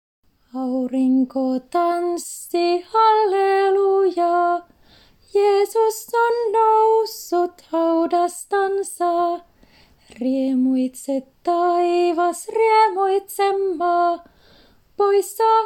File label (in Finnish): Virsi Stemmanauha Sopraano